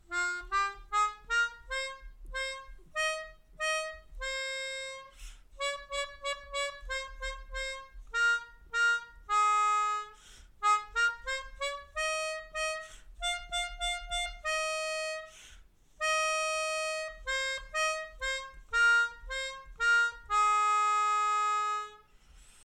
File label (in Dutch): Klap eens in je handjes, Melodica